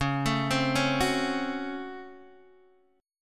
Db Major 7th Suspended 4th Sharp 5th Db Guitar DADGAD